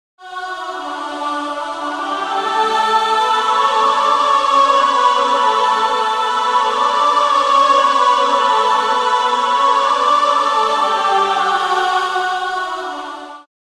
Angelic Vox, Choir; Magnus Choir; Syntheway

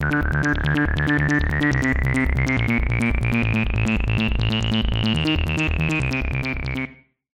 Acid Bassline; Synthractive; syntheway

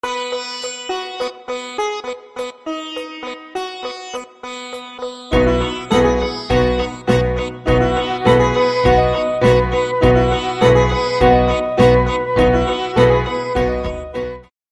Sitar intro; Syntheway

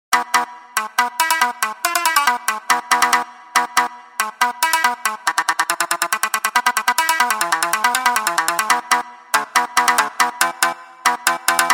Shamisen; ShamiKoto; Illusive Shamisen; Star Ocean

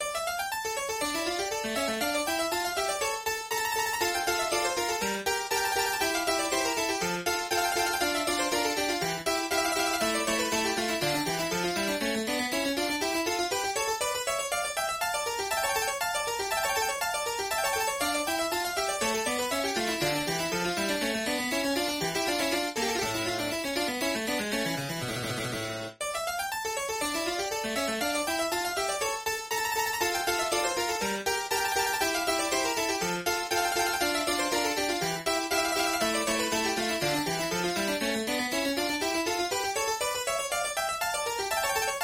Sonata in D minor Allegro, Harpsichord; Domenico Scarlatti; RetroMagix Harpsichord

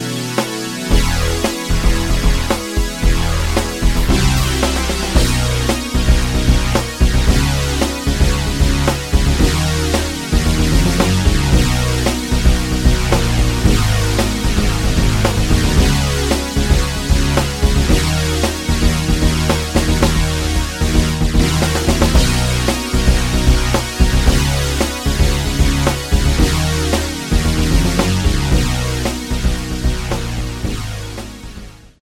Moog Minitaur Reso Bass; Bassesland; Virtual Acoustic, Electric and Synth Bass KONTAKT Sample Library for NI KONTAKT; Syntheway

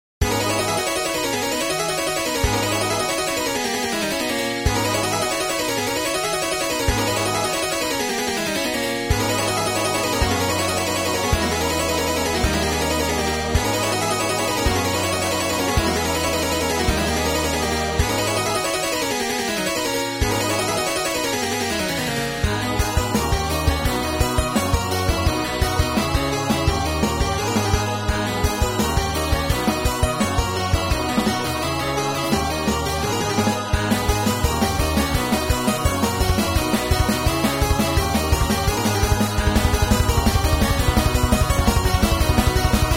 Harpsichord, Choir; RetroMagix Harpsichord; Magnus Choir; Syntheway

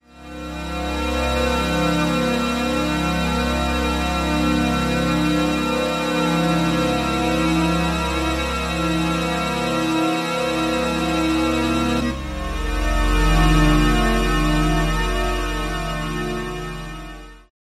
Oscillator PAD; Alphatron; Dystopian Worldview; Syntheway

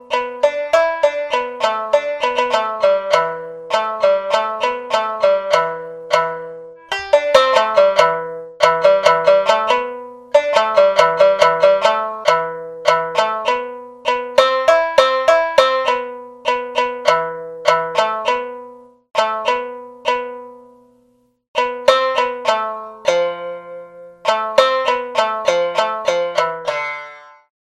Shamisen Sukui Bachi Upstroke; ShamiKoto; Syntheway

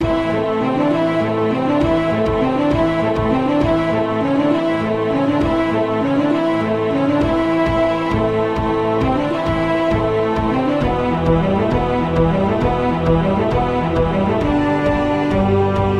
Strings Brass Harp Percussion; Syntheway Strings; Chordophonet

